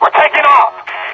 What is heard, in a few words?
chopper, soldier; Left 4 Dead 2; Source Engine; video games; npc